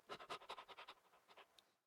dog breathe; smite; god daji skin15

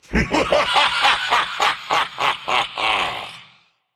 laugh; smite; ares

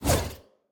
whip swing; smite; osiris

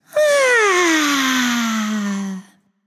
breath, voicepack; smite; jorm

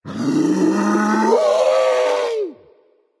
battlecry berserker; cuchulainn

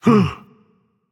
Breath Dragon; fafnir

